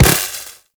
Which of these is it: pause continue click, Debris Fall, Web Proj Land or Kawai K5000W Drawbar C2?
Web Proj Land